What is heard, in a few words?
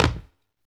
STAM, Human Perc, Body Perc